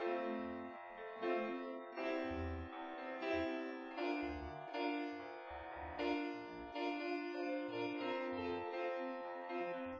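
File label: nodrums instantaneous source; SiSEC10; underdetermined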